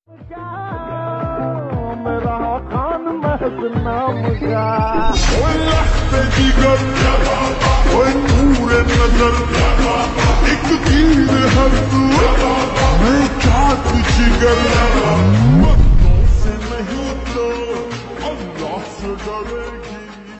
slowed reverb ringtone

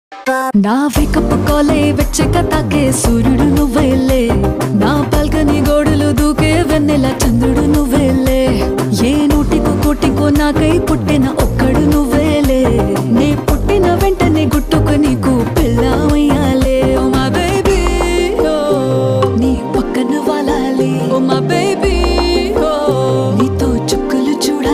telugu ringtone

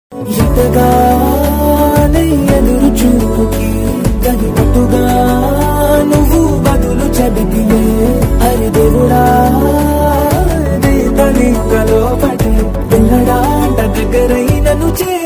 tamil ringtone

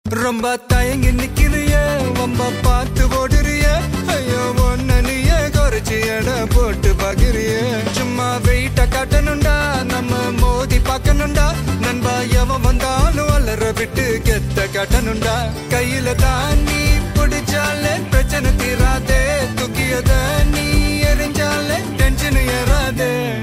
tamil ringtone

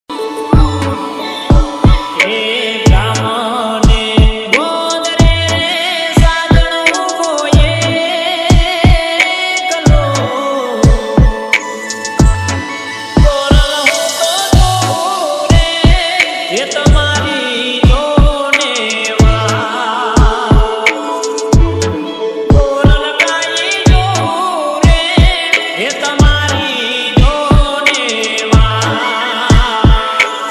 gujarati ringtone

